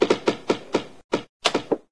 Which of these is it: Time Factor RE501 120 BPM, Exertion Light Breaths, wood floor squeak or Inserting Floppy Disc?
wood floor squeak